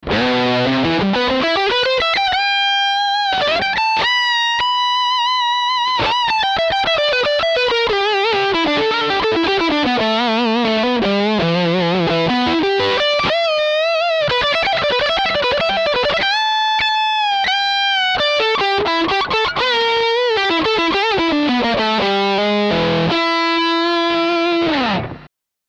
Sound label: harleybenton ex 76classic